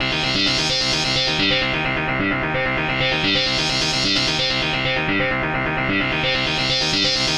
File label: 130 Cm synth, Synth Loops, Trance; Trance Explosion Vol1